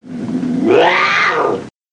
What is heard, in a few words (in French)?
lynx, Musique